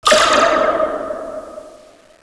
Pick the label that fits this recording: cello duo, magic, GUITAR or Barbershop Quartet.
magic